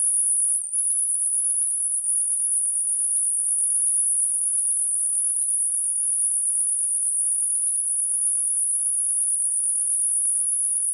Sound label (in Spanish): las cigarras